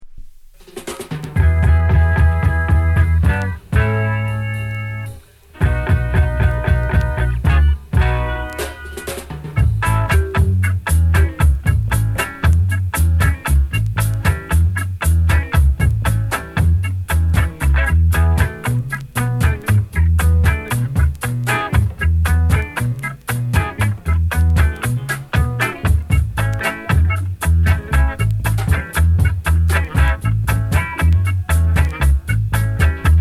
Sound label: KNOCK ON WOOD